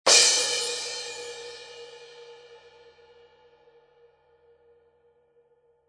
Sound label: fast crash; Sabian 16" AAX X-Plosion Fast Crash Cymbal; crash cymbals; Loud Hit; super-fast